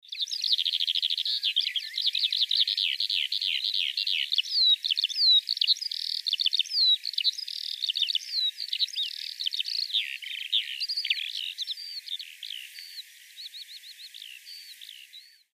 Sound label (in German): Lerche